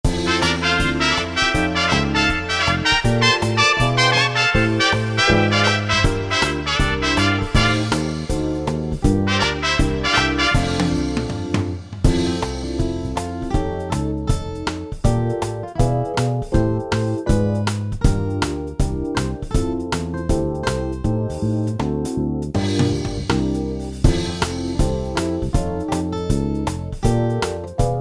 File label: Jazz; Sofovich al mediodia; musica para tv